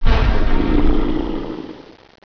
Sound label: Die; Mask Die